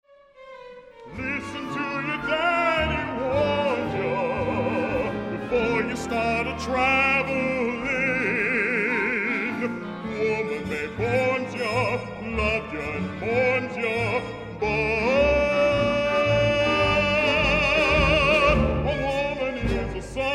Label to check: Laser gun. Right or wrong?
Wrong